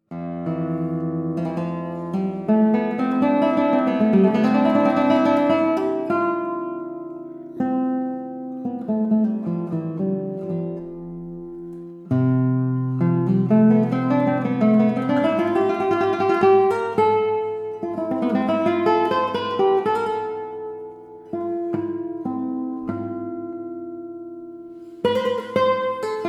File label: levantine suite postlude